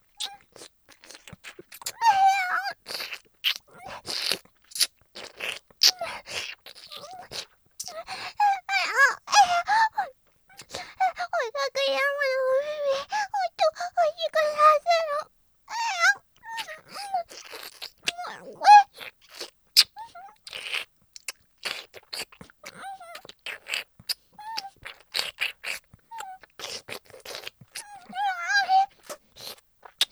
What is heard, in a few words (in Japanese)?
フェラ; 音声ケモノ仕様！; フェラ増しスパイス！